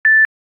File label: Beep Once; Samsung Galaxy A3 2017; notifications